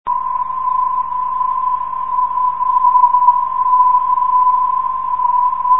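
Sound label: bfk 420khz, non-directional beacon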